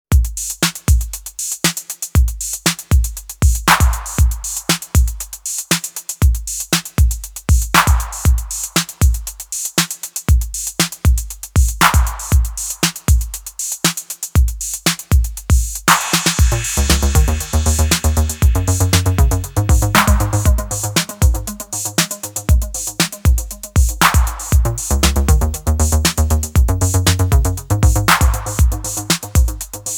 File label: Pop Song